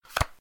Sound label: card